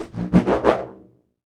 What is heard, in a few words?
SPIRIT CATCHER